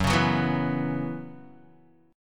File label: Gb 7th Suspended 4th Gb down Guitar, chord